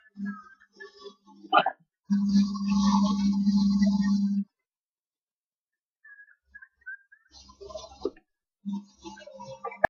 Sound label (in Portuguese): risada